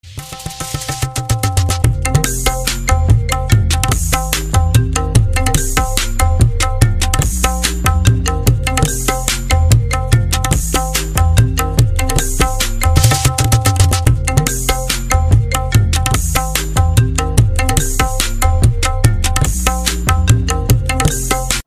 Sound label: iPhone Remix Ringtone